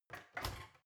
oven open squeaky

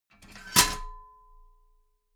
metal ladder small handle fold heavy

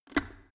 plastic water boiler close lid fast

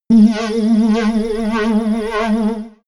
alienation

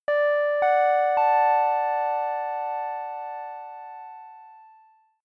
announcement keys major

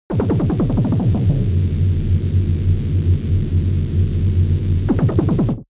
space alien engineroom calm

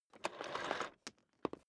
vacuum cable drag out single

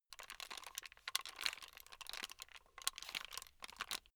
plastic rattle slow

